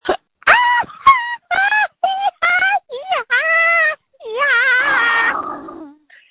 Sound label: screams